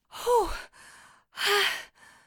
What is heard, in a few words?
Catching Breath